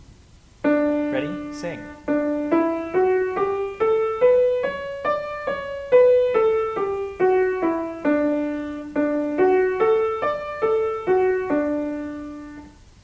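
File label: D Major, scales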